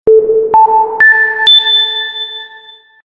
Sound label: Sinus; Sony Ericsson; J108 Cedar